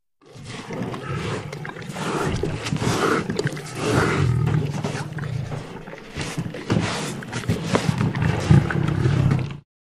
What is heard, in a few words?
Lion Licking Trainer; big cats